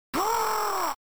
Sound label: suck mouth; global gladiators; Amiga